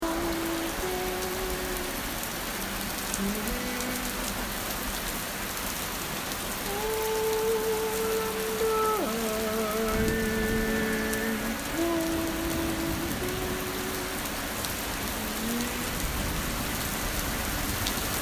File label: Thunderstorm, Berlin; Sanderstrasse